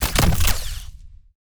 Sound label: krossbow